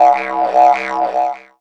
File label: Ethnic Synths, Dance Synths; Best Service ProSamples vol.37